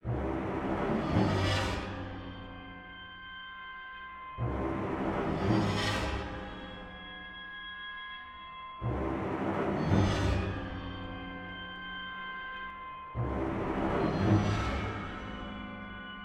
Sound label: Craftsman Music Pasive